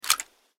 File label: sniper rifle reload